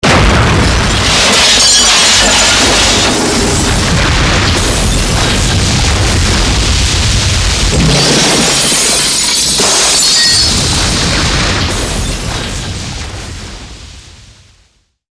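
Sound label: explosive destruction sequence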